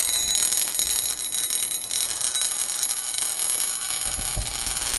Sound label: metal wheels screeching; alarms